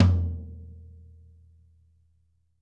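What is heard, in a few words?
floor tom; drum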